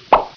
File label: pop